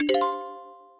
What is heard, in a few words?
mail received